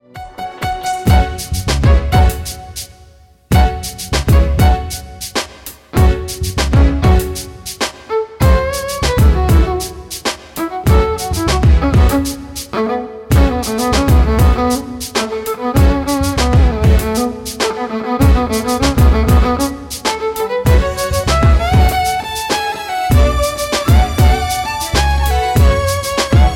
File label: violinist; classical musicians